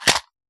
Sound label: matchbox drop; civ13; civ14; Items